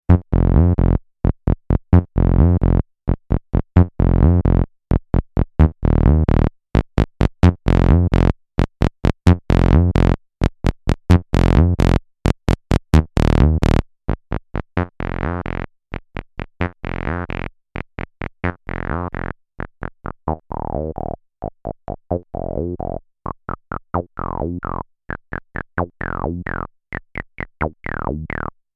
x0xb0x Saw

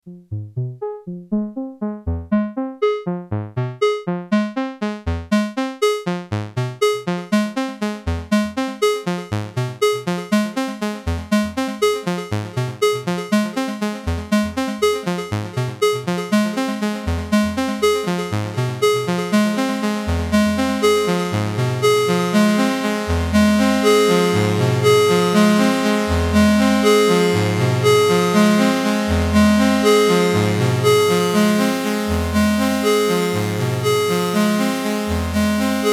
Dreadbox Telepathy Bundle Arp Delay

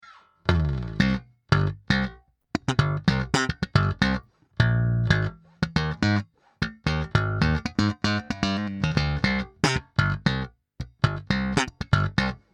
Ampeg Opto comp Slap

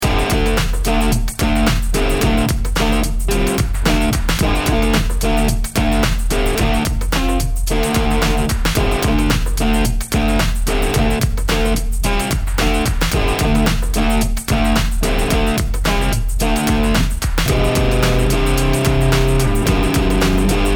Breakbeat Combo Style

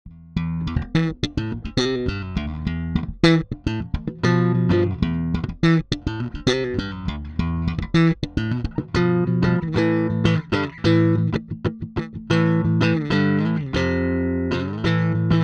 Both full slap

